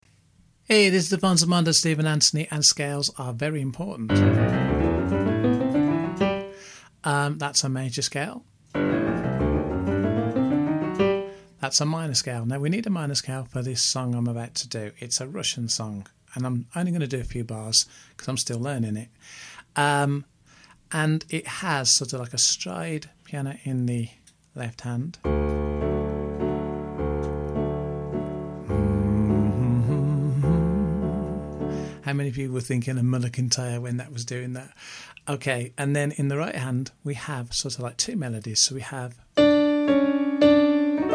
trying to play the Russian piano peace called Dark Eyes